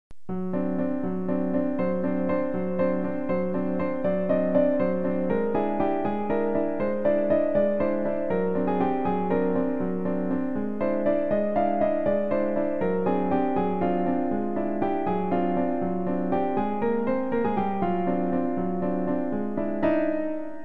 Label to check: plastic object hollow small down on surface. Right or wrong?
Wrong